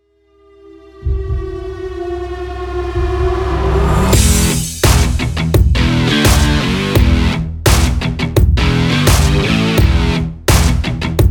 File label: Hip Hop Stylish Rock